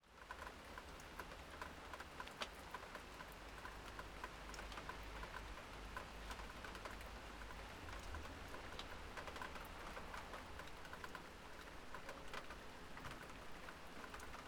rain urban medium light steady some distant car passes; Pole Position; Thunder & Rain